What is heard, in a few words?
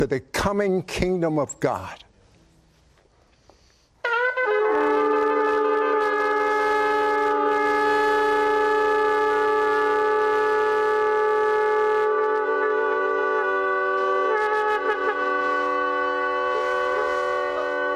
Shofar; cut from Sid Roths Rosh HaShanah Message on 22.09.2025; The Feast of Trumpets